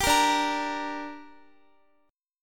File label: D Suspended 4th Sharp 5th D down Guitar, chord; strummed